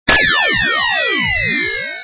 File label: laser hit; undercover; Junkbot